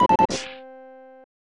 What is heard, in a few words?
Unused Jingle; Super Double Yakuman 2; SNES